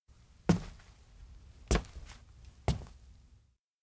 steps indoor broadcast walk in; anemoi

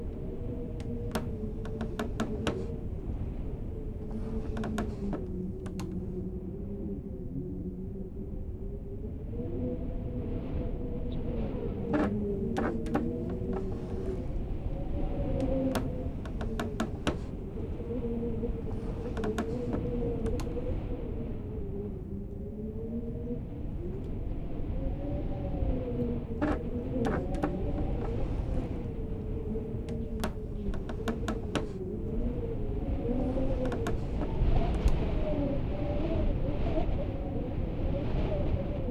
wind in room; anemoi

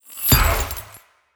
trigger out; anemoi

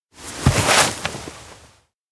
get up; anemoi パッケージ版 1.1

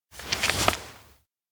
peek dictionary; anemoi パッケージ版

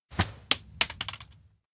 crumpled note hit; anemoi

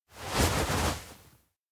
wave; aino; anemoi

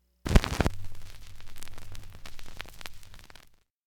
record play; anemoi

